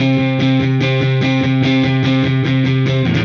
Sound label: Silos Dirty Strum Guitar, Indie Rock